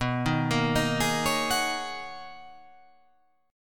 B Major 13th B down

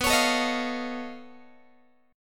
B 9th Flat 5th B down Guitar; B9b5 strummed; JGuitar

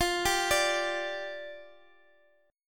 G 5th F, chord; G5/F arpeggiated

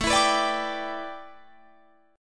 A 9th Suspended 4th Guitar Drop D, chord

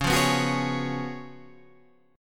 Db 11th Db, Guitar, Drop D

